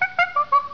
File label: CHIMP, animal; 动物